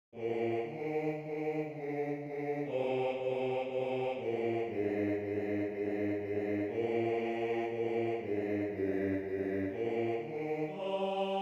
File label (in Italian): Basso; Base basso